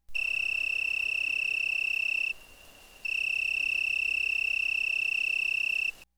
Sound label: Pulsing Crickets